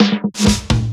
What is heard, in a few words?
Fills 128BPM, Clubsounds